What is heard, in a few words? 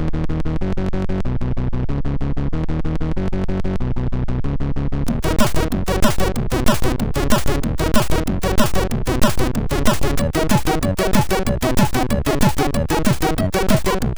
House Dance, MOS6581R4